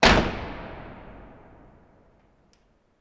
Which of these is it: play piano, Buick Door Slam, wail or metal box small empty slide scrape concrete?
Buick Door Slam